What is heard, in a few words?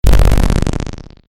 fail sound; Kantoku Skin; Explosion11